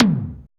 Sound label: HARD E TOM, TECHNO; Drums In Your FACE!